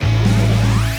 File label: Short Waiting; Timberman VS; ps4